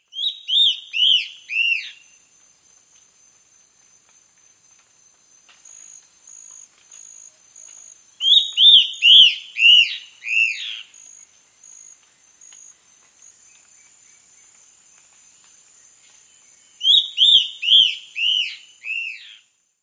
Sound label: Reddish-Winged Bare-Eye; S. America